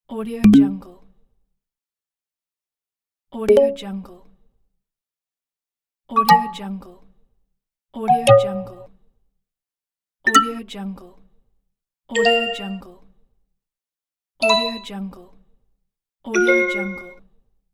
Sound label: notification pack